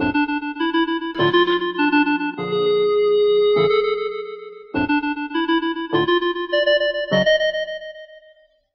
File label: keys